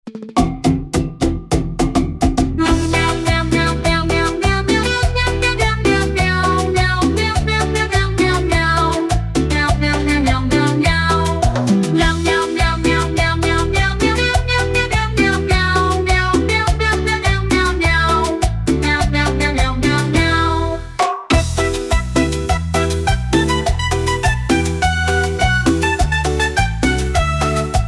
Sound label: Meow Cumbia; MusicBoxAI